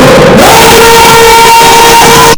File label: nuclear missile